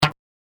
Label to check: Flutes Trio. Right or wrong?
Wrong